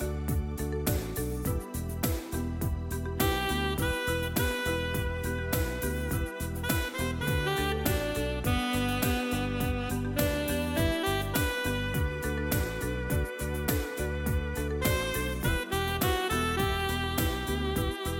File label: Sax Solo